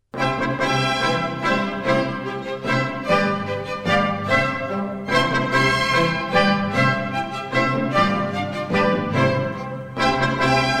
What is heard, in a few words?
German Dances, C major; Mozart; Dances and Marches